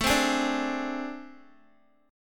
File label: A Minor Major 7th Double Flat 5th, down, Guitar; AmM7bb5; strummed; JGuitar